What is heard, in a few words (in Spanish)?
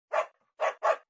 perro